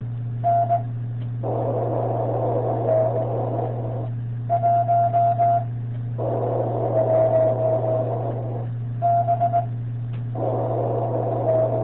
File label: 432 echo; n1bug